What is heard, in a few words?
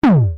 BD, vermona drm1